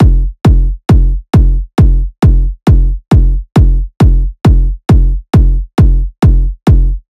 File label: Kick Loop 135, Techno Drums